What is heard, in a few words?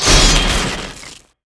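skill ice bolt; QSkil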